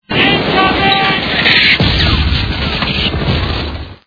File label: incoming